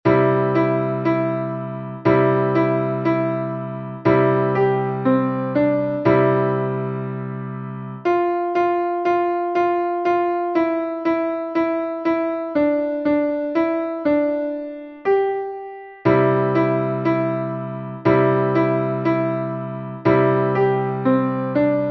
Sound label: Easy Piano